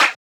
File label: Clap; Vengeance Samples